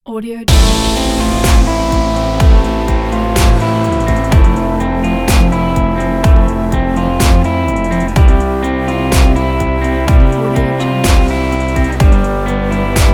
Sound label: sport loop